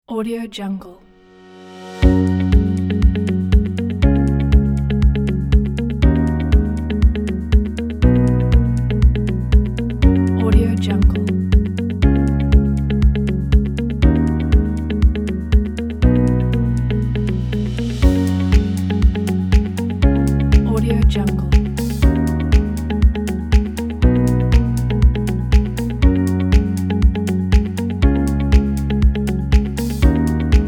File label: upbeat corporate motivational; dailywaves